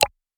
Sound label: click